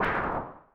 weapon ranged; adarkroom

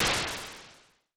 asteroid hit; adarkroom

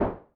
weapon unarmed; adarkroom

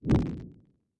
stoke fire; adarkroom

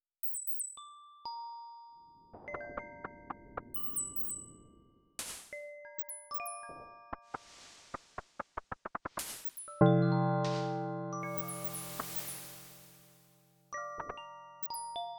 tiny village; adarkroom

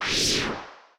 upgrade engine; adarkroom

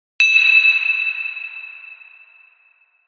crystal

